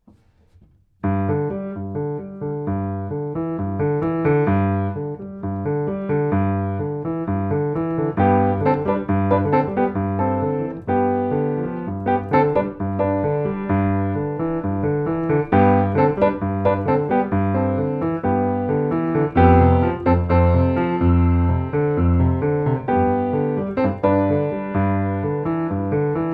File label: Piano, UM17; SHAFER GRAND PIANO; AMI UM17